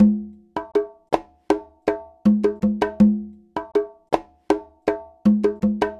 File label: Conga, Latin Percussion